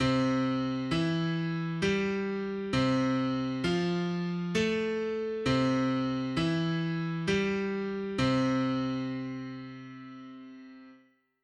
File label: sing midi c